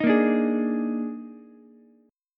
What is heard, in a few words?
Eb Major 7th Suspended 4th, Ukulele; EbM7sus4 strummed; 8,10,10,11; JGuitar